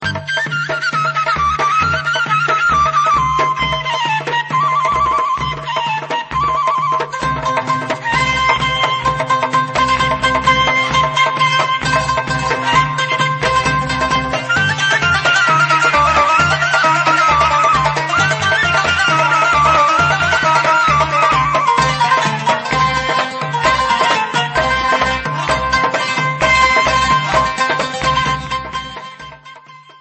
Debka Instrumental

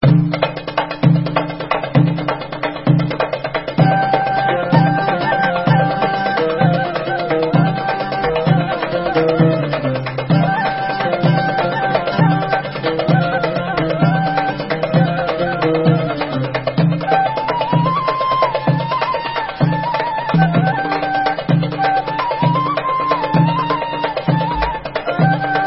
Debka Instrumental

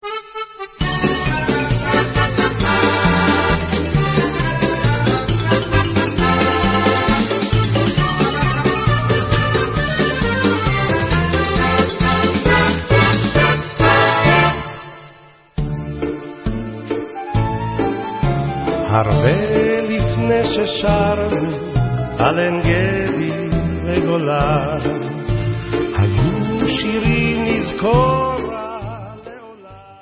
Akordion Yashan

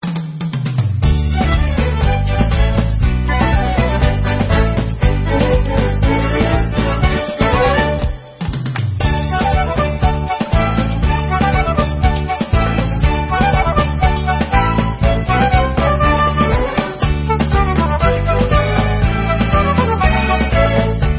Instrumental

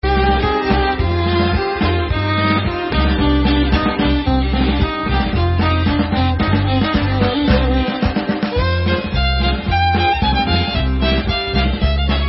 Instrumental

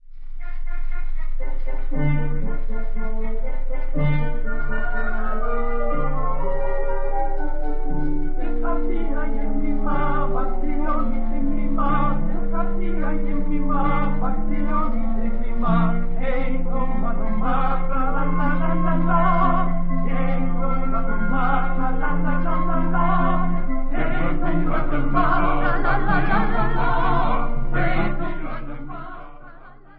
folk

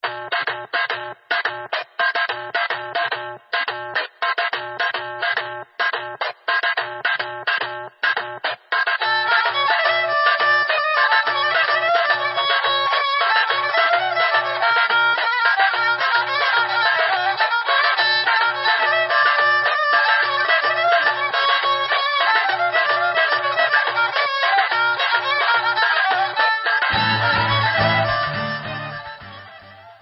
Horo, Bulgarian; Graovsko

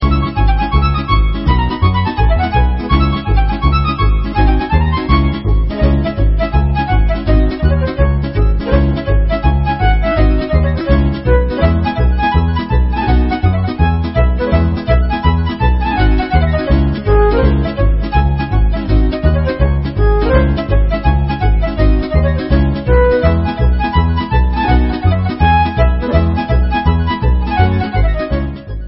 Polka Instrumental

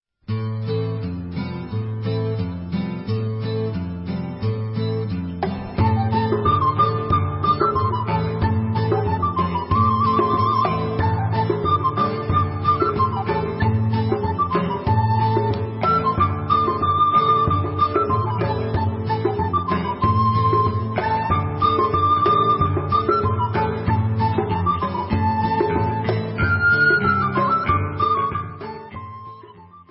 Debka Instrumental

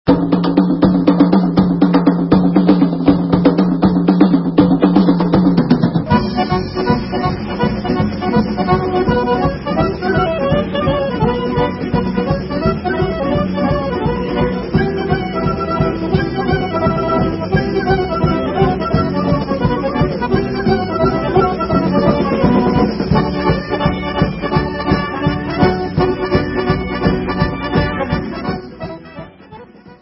Instrumental

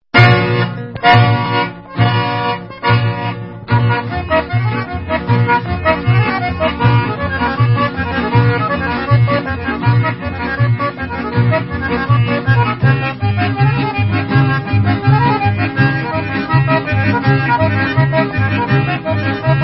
Folk Instrumental; Chachak

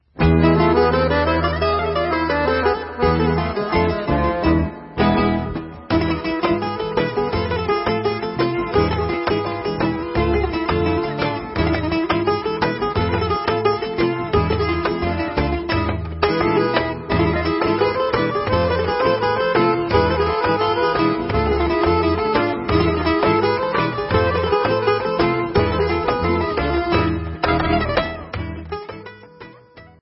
Instrumental; Ladino

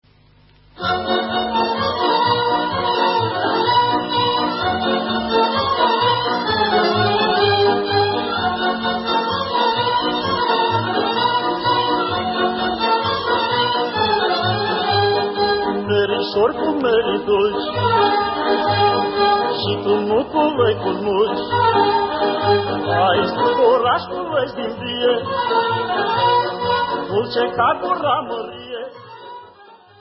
Rumanian

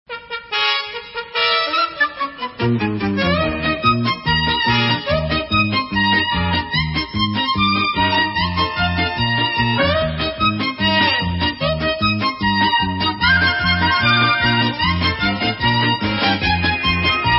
Instrumental

